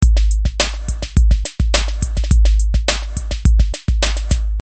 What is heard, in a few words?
beat